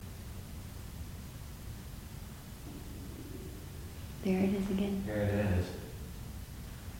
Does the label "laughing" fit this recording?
Yes